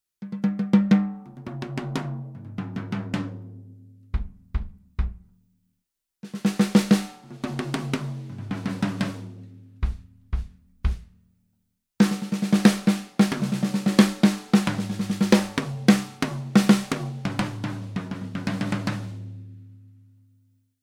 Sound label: bigbeat jazz single drums